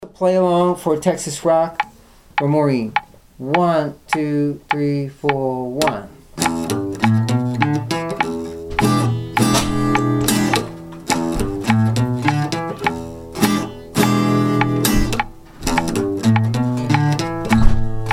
texas rock play along

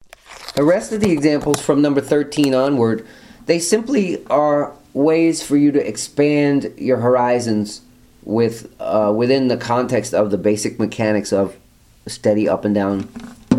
strumming

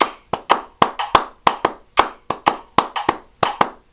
clapped

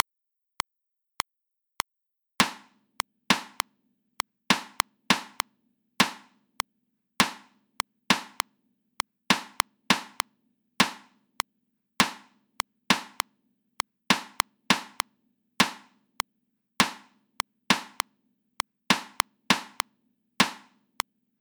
rhythms at 100 bpm

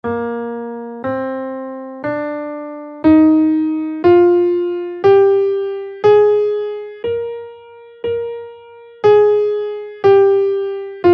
mixolydian scale in Bb, guitar

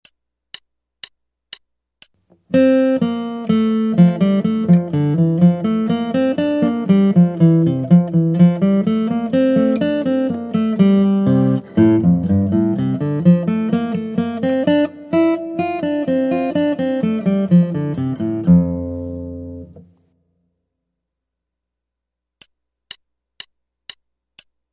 Guitar

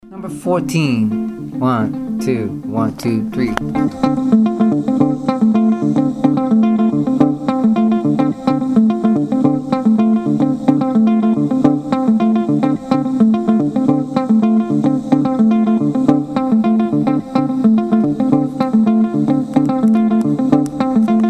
mozambique patterns

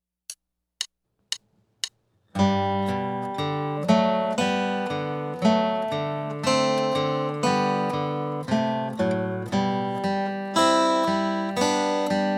Australian Hymn

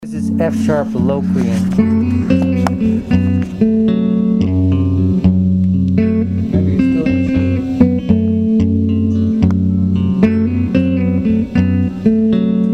modes in key G F locrian

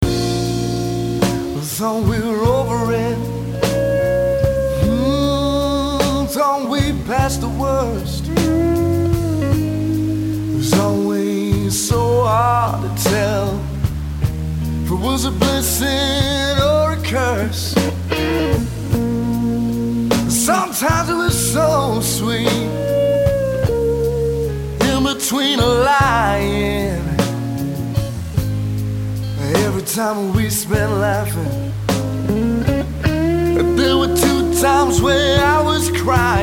blues loop; 12-8

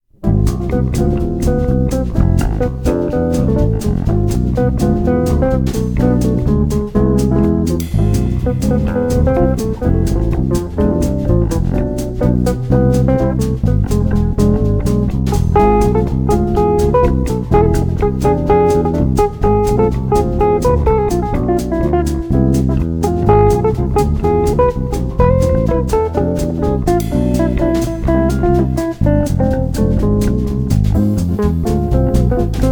ultralocrian

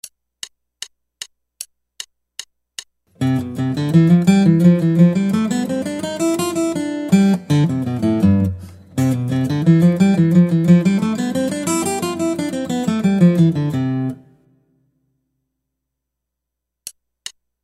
Guitar

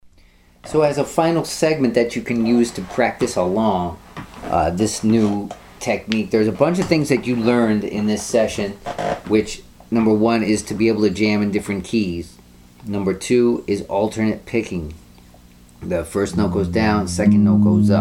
blues

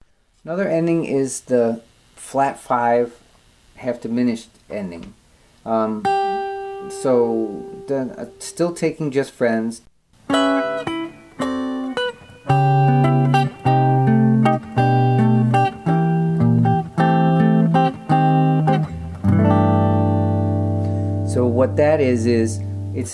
common endings bVm7b5

